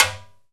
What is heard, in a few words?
piala, timbales